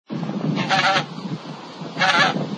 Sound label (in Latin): anser fabalis